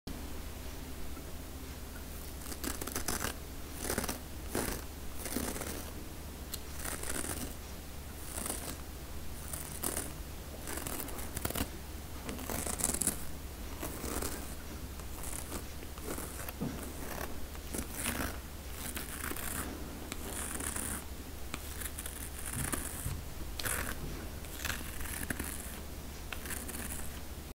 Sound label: shaving face